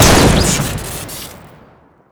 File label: slamfire shoot crit; tf2classic